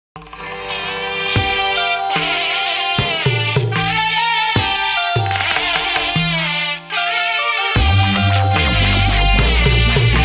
Origin Mridangam; Nokia Ringtones; Lumia 830

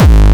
Bombo Hardcore, kicks

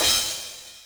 CYMBAL, Yamaha RM 50

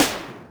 snaredrum, Yamaha RM 50; Drum Samples; Snaredrums

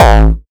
Hard Kick

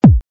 kick

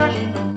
Odd Loop a, Sound FX

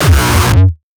kick; shock. Ultimate Kicks vol. 2

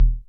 kicks, Bass Drum

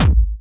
Soft, kicks

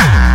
bombo, kicks, Hardcore

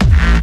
Kick n Bass

